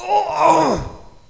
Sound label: death; Alien Arena; infantry